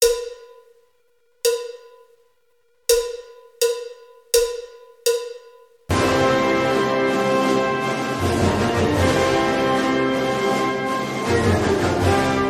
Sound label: Fanfare Tattoo